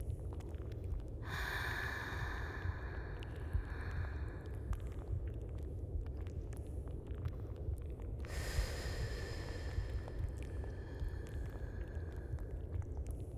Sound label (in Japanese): 腹式呼吸ループ音源; 田舎のクールな美人巫女と自己犠牲愛2 アナニー(エネマグラ)向け